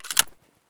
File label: sv98 jam slide out; Escape from Tarkov